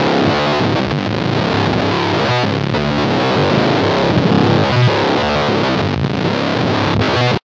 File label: industrial grind